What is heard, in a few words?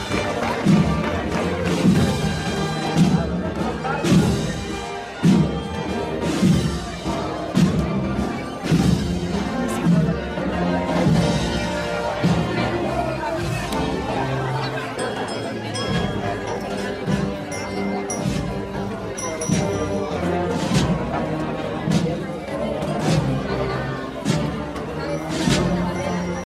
ancient catholic ringtone; bollywood ringtones